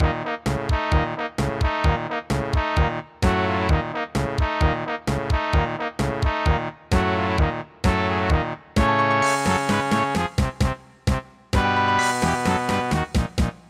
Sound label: Pirate; Sea Legends; MS-DOS